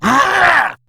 Attack, Battle Barks; en; Grineer Ghoul Sawman

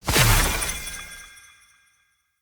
Team Buff Burst; Enemy; Sinecure; Melee Grunt Dual Agent; Abilities

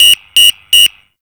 Panel Alarm; Warframe; NineteenNinetyNine; Stage12PartyOfALifetime

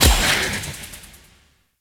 Disappear Whoosh Hit; Warframe; Zealoid Prelate

